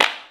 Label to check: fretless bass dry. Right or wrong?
Wrong